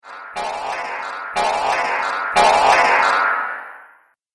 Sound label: Robot Pumping Motion; Robot Sound Effects; Sci-Fi Robot Sfx